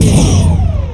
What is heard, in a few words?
Impact